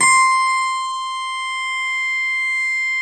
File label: 12 String C5, guitar; Twelve String Guitar